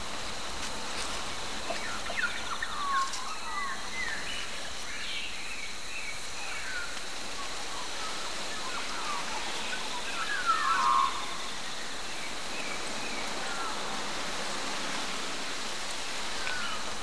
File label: hit n run forest